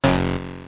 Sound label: Warning; Vitamin